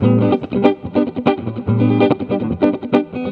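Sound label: JAZZ GUIT GROOVE; Best Service; World Colours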